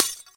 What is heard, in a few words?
glass tile; tuxpaint; magic